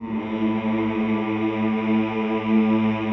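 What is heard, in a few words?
choir A1, men; Sound & Vision - Gigapack I CD 2 (Roland); Men-Combi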